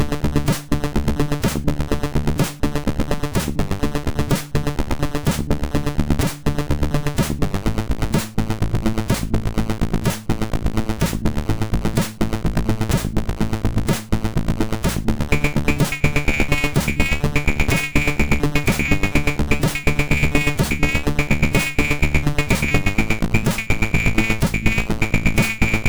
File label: Techno; Moon; MOS6581R4